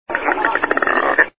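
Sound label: smew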